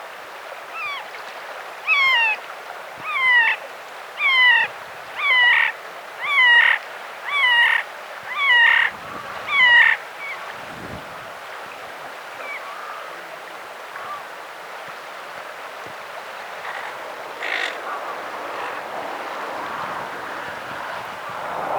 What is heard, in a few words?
Horned Grebe Podiceps auritus; waterfowl